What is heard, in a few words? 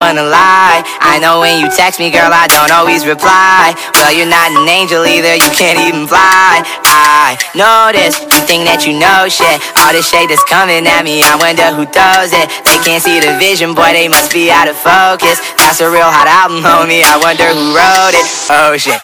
angle rap